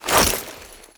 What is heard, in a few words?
Bayonet Melee Whoosh Gear Accent; Warframe; Tenno